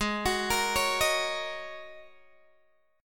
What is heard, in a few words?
Ab 7th Suspended 2nd Suspended 4th, Guitar, Open G